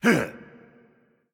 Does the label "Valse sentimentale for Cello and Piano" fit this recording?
No